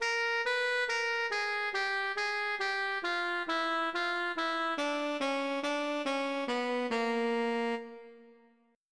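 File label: diminished ex; The Diminished Scale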